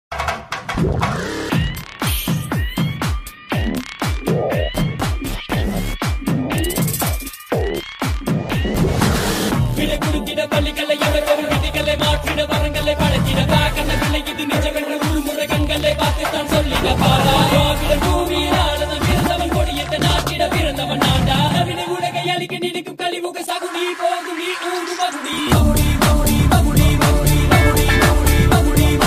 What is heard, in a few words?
tamil bgm ringtone